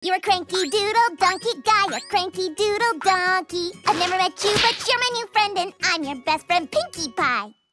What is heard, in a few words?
Acapella